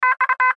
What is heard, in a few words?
Radio Morse Code; Morse Code Letter X; Morse Code (Sound Effects:Electronics); Blastwave; Warfare